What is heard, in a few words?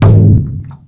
bounce; Birthday Party Bash; Wii